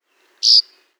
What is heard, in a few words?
Northern Rough winged Swallow Cheet